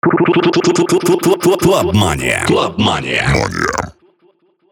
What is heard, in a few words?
Jingle; Clubmania